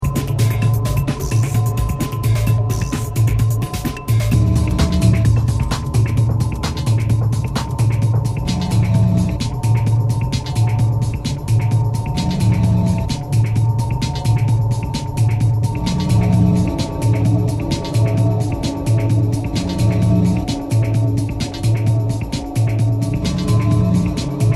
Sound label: Purring Machines